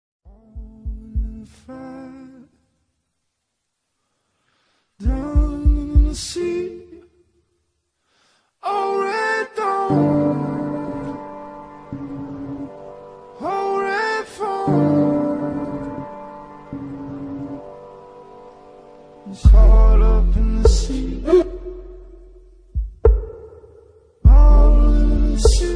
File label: boys choir